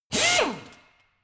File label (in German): impactor medium, bohrer; maschinen